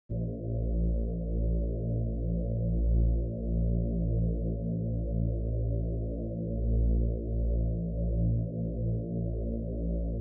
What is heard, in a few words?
multirotor; Uber17; NASA